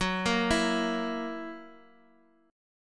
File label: Gb 7th Gb down, chord